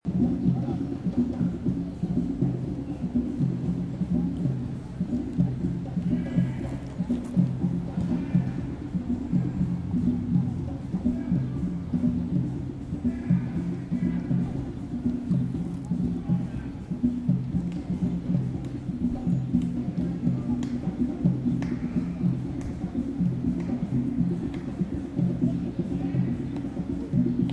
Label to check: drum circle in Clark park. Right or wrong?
Right